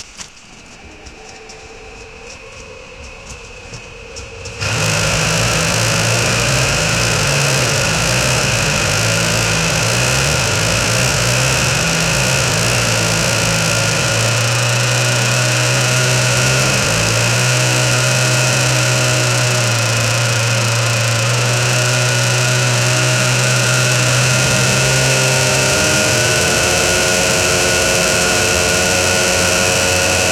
vintage motorcycle bmw r7; snow

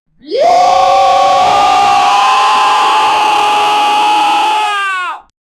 lots of cartoon character, cheering

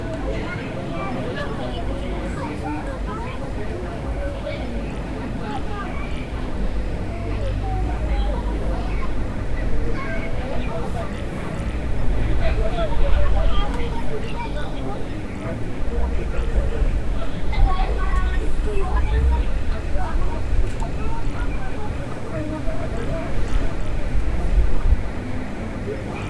the sounds of an evening, cafe

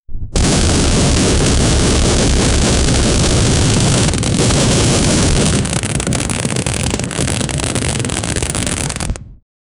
big automatic cannon, machine gun

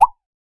short cartoon single remo, toy

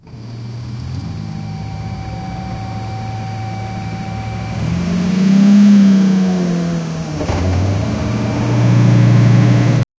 loud truck

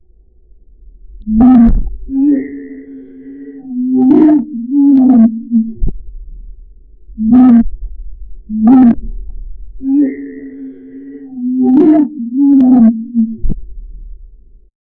i want deep sea whale, whale calls

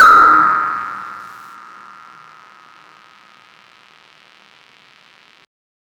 a one shot sample of, analogue

